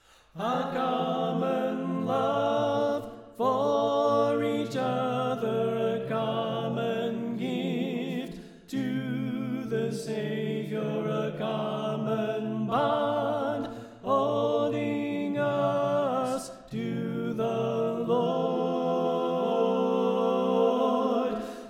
Tenor Left

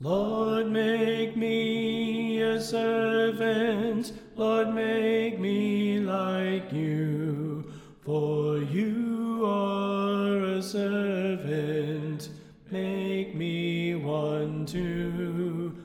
Soprano Left

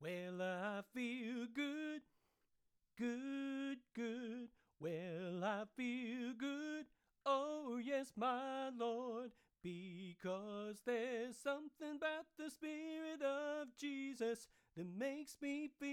Tenor Left

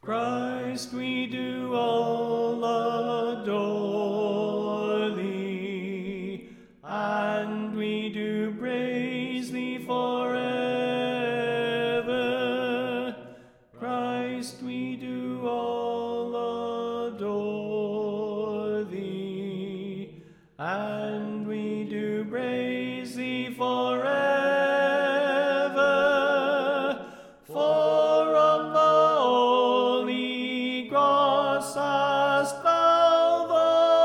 Soprano Left

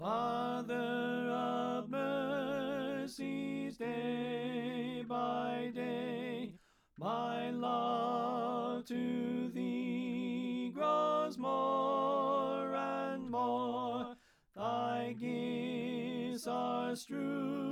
Tenor Left

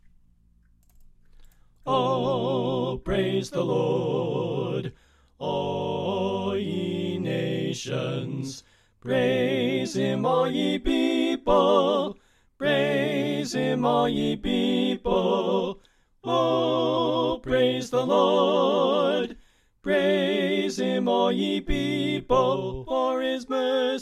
Soprano Left

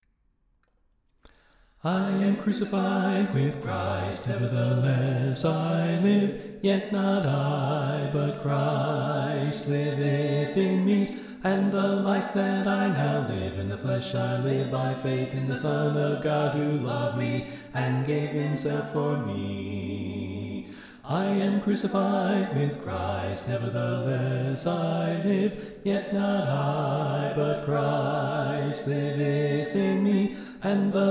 Bass Left

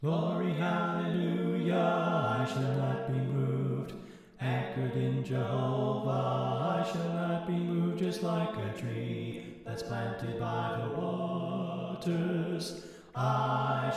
Bass Left

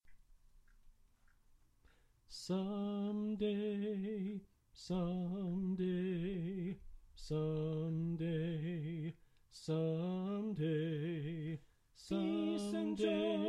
Bass Left

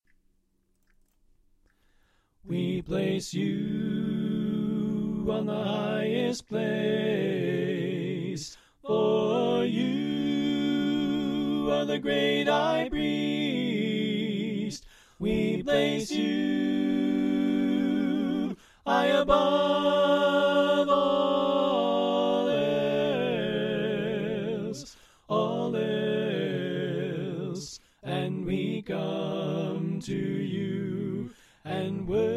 Tenor Left

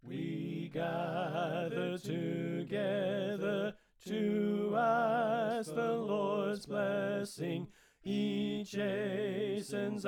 We Gather Together Tenor Left

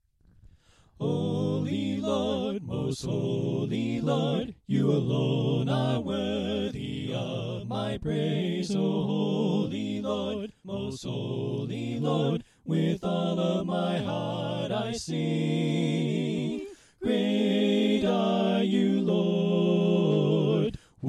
Soprano Right

